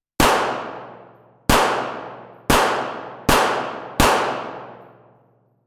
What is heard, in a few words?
Sfx Pistol Gunshot